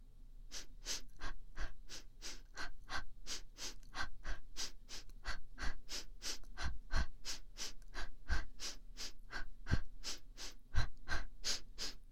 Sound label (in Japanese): ランニング呼吸左 (早め); 呼吸音足音なし; 左耳用